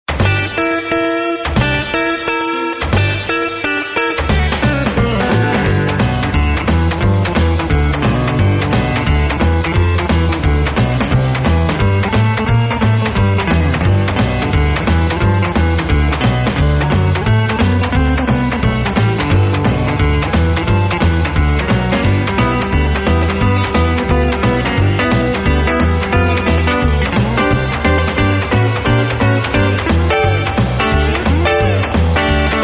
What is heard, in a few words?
Guitar Boogie